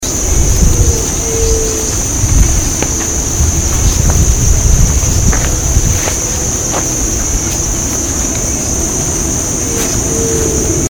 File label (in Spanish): yeruti